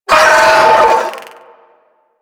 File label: Sentient Vocal Pain, Enemies; Warframe; DE; Sentients; Ortholyst